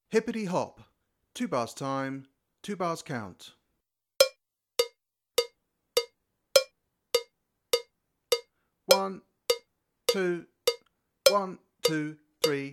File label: Hippedy Hop, drum kit